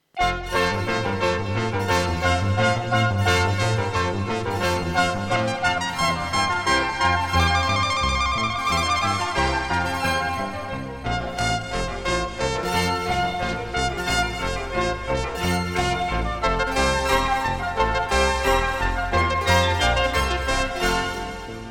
Electro transcriptions, Brandenburg Concerto No 2 F 1st Movement